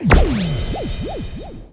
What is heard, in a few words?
start; Pump It Up Exceed